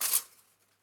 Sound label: blind